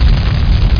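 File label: AMBIENT FIRE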